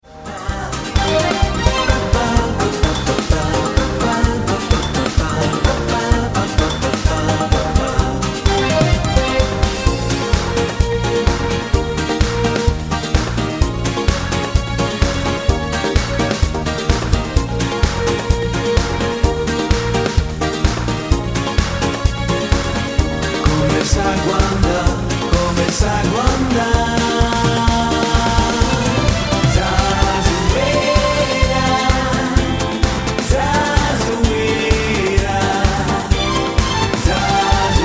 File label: Medley